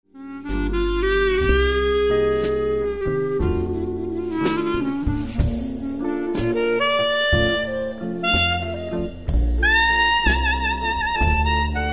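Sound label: clarinet, jazz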